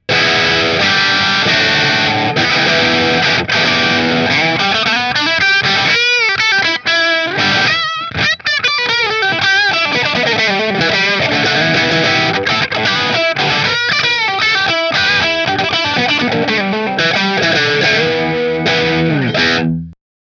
Nola Voodoo Green Bridge Marshall; New Orleans Guitars Voodoo Custom Dark Green

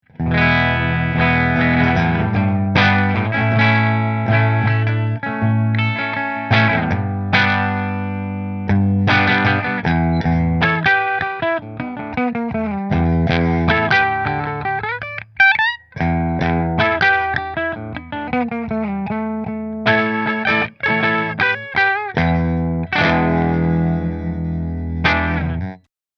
Greco Bridge Fender, guitars; Greco Les Paul Custom White

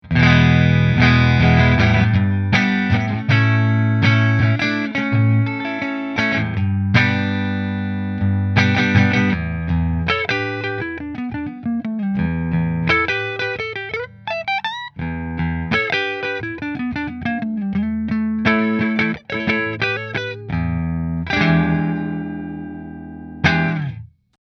Melancon Neck Mini Position 2 Fender, guitars

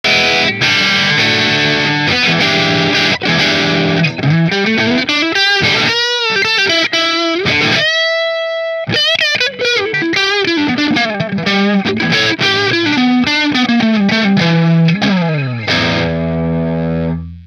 Mcnaught neck marshall, guitars

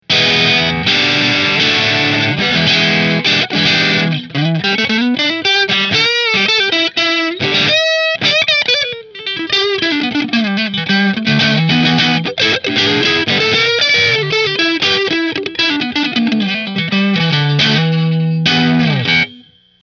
Rahan Double Maple Position 2 Marshall, guitars; Rahan Guitars RP Double Cut Figured Maple

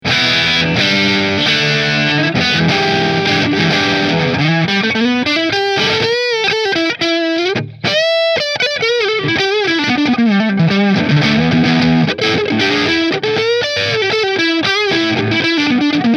Master Neck Marshall, guitars; Masters Guitars LPM Pro Cherry Sunburst

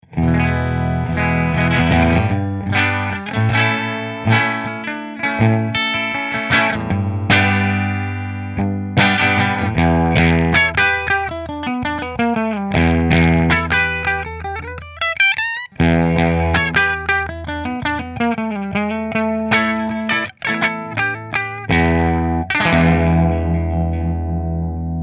Heritage Bridge Fender, guitars

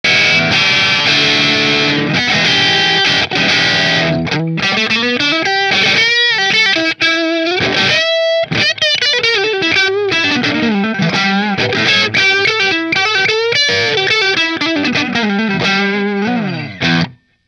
Music Man JP neck marshall; 2002 Music Man JP-6 Mystic Dream

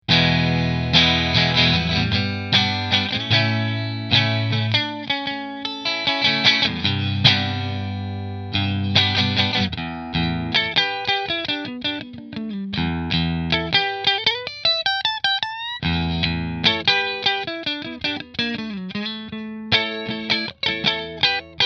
Fender Strat Sunburst Position 3 Fender; Fender Stratocaster Sunburst 20th Century American Standard

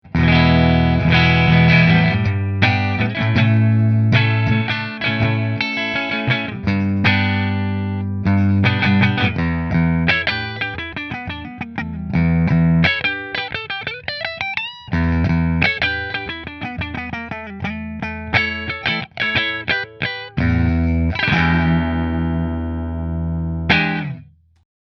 Nola Voodoo Neck Fender, guitars; New Orleans Guitars Voodoo Custom Dark Green

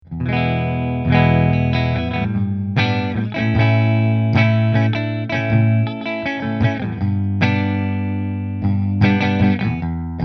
Burny Middle Fender, guitars

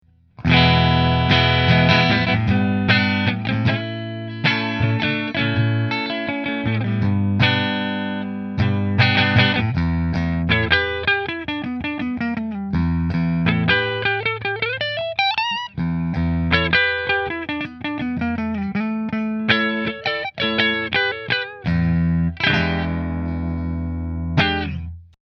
Nola Voodoo Tabacco Neck Fender

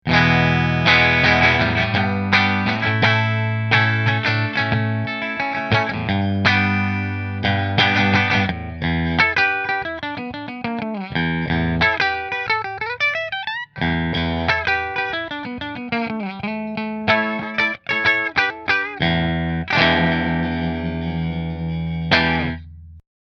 Nola Voodoo Bridge Fender, guitars; New Orleans Guitars Voodoo Custom Quilt Top

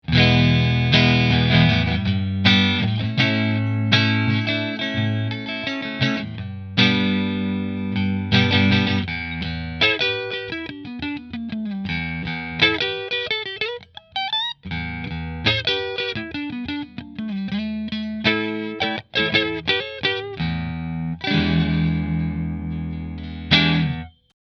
Rahan Double Maple Position 1 Fender, guitars; Rahan Guitars RP Double Cut Figured Maple

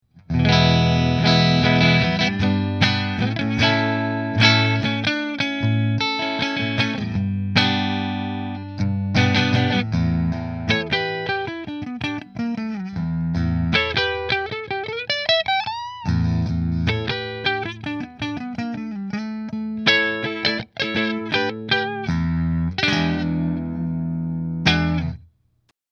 Nola Voodoo Redwood Neck Split Fender, guitars; New Orleans Guitars Voodoo Custom Redwood Top

